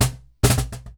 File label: Cajon Fill; percussion; musicradar